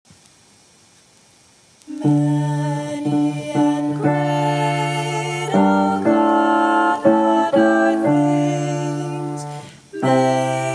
Dakota Hymn, choir

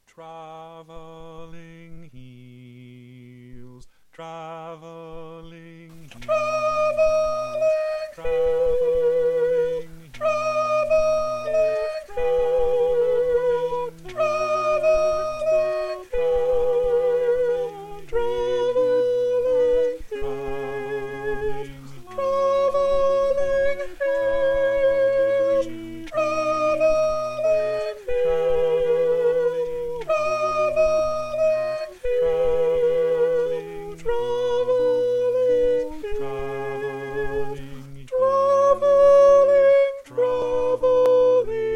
soprano

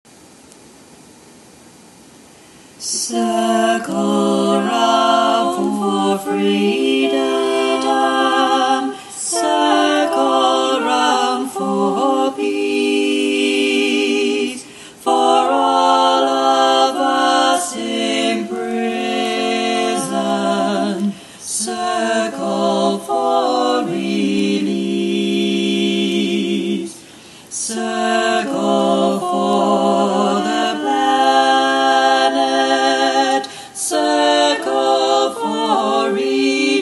Circle Chant

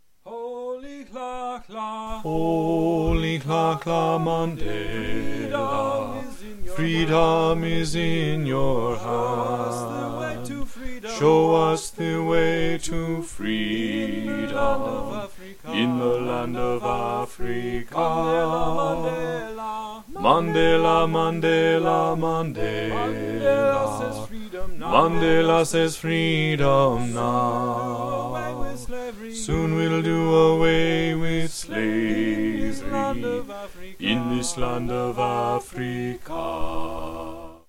Rolihlahla bass, choir

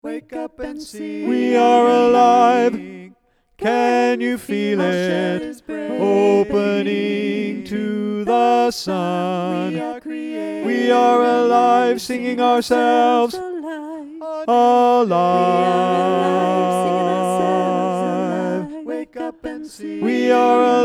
Bass; 2023 Fall Repertoire

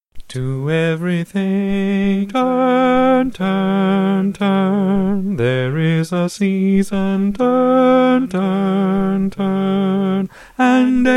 harmony

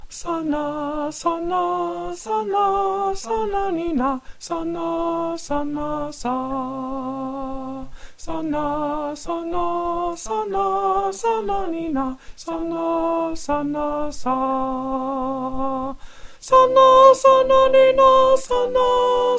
soprano

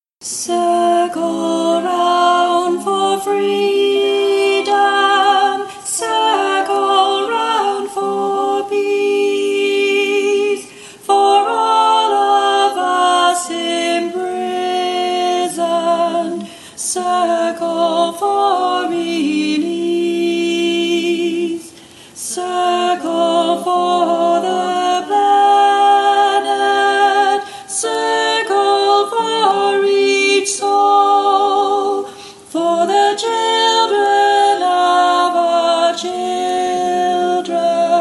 Circle Chant soprano

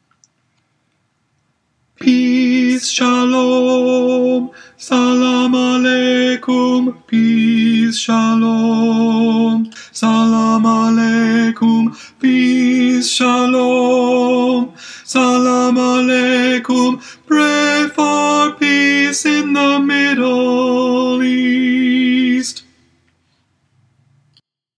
Tenor

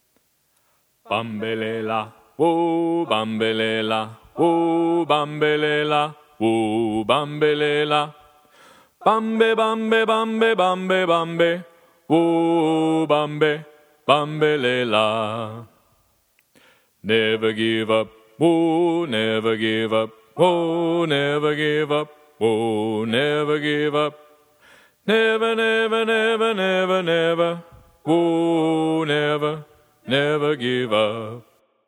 Bambelela bass, choir

